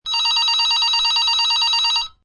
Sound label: Telephone Digital Ring, Telephone, digital, 3 rings. Digital telephone ringing three times.